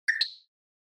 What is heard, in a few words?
Percussive Accent Up